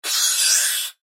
Sci Fi Air Burst Expel Pneumatic Hose Discharge; Doors

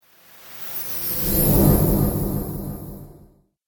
Spell Of The Fallen, magic sound effect

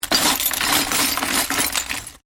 Glass shards movement

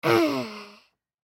Human Groan; Groan Male Six Yrs Boy; Pain; Blastwave

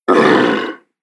Fantasy Monster Grunt

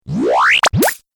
Magic Coin, cartoon; Cartoon Sound Design Sound Effects

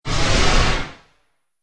Modulation Whoosh; Whoosh Sound Effects

